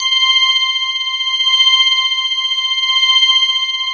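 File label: ORGAN, right; East West - Phat & Phunky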